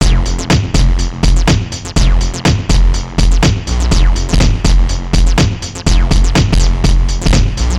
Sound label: Fat Bass Hat Acid